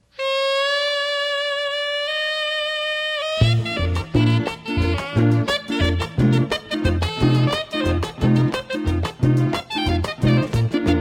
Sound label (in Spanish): Sax Puro